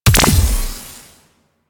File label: Note Trigger Destroy; Warframe; BardQuest; Bard Boss Fight Notes